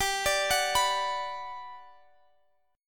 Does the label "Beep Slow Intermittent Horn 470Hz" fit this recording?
No